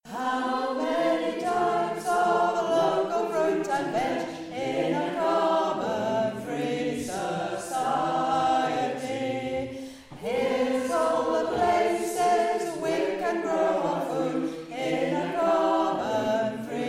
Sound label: Harmony